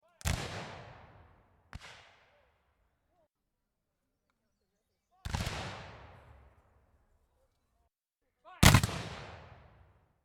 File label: rifle musket fire volley